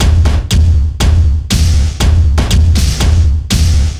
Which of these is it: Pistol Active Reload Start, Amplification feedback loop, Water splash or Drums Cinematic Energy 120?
Drums Cinematic Energy 120